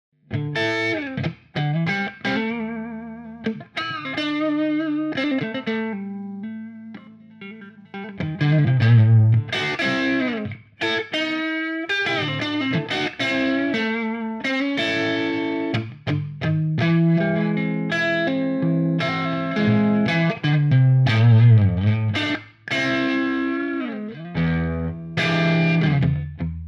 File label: Clean; CustomSLO